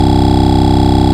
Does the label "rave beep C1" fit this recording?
Yes